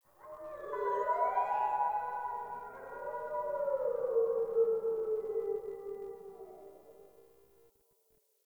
Exterior Distant Calls Happy Calm, Ambience; Warframe; Duviri

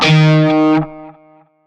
Shawzin Oct Two D Sharp; Music; Warframe; Narmer Shawzin

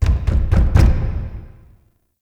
Knock; Shadowgrapher; Warframe

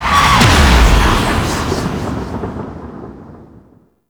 Cet Invasion Offering Paused Generating; Warframe; Cetus

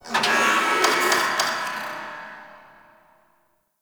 Gas Machine Rand; Warframe; Corpus Gas City; Ambience

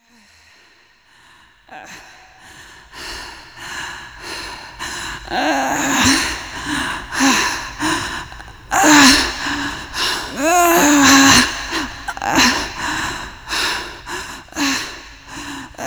Female Crawl; Dax; Warframe; Cinematics; The New War; Lua War Room

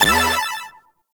Hi Score; Warframe; Nineteeen Ninety Nine; Deep Archimedia

